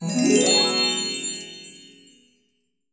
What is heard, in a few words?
Piggybank Reach Min; Ellen's Garden Restoration; android